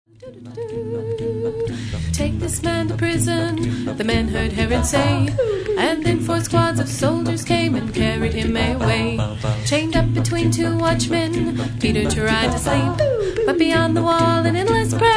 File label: a capella hymn; angels